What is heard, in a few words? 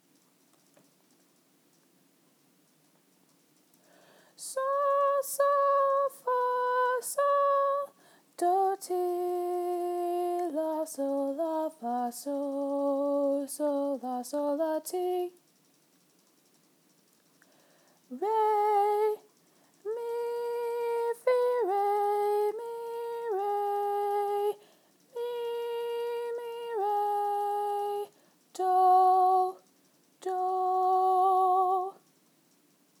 alto